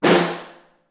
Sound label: SN2 Far, Snare; Ableton Foundation; Snare Designer